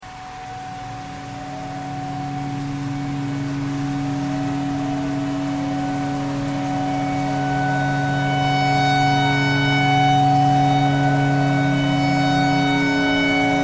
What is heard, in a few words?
tornado siren tornado siren thread pentatonic